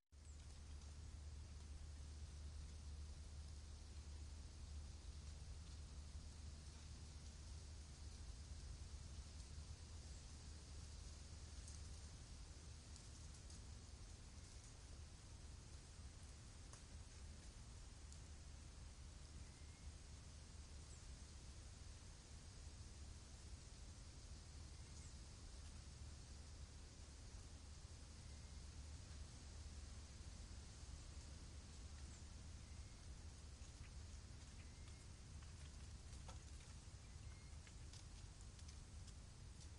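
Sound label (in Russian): атмосфера воздух шелест листвы; Звук ветра в лесу; звук ветра в листве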